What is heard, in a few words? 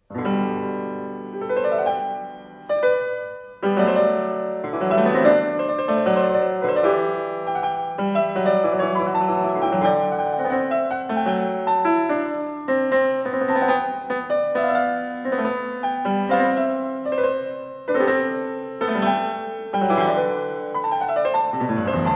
Bach Goldberg Variations; Klassika; Bach, Johann Sebastian